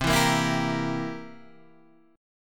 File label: C 7th C, Guitar Open G, chord